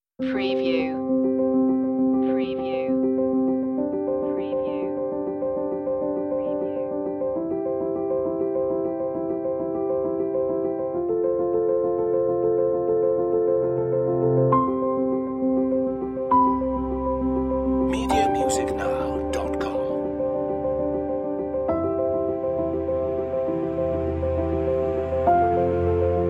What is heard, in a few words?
waves, wedding